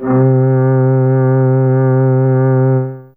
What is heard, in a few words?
Quartet C3, HiString